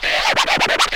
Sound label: Scratch; Club-50 - Foundations Roland